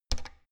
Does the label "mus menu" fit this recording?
No